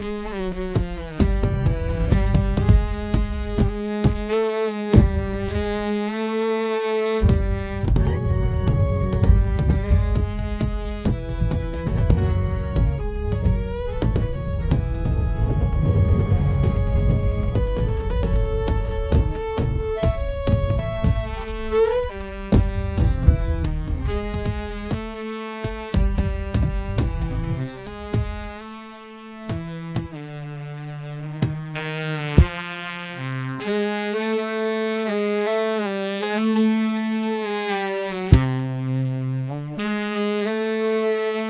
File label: main theme music for game